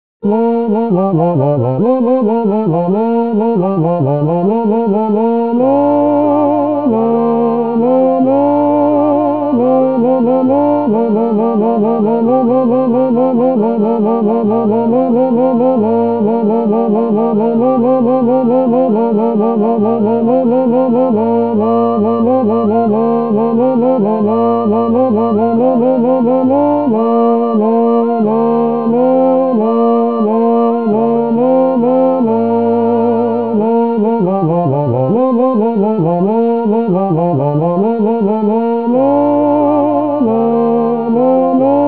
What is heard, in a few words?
Tenor; Dance to your Daddy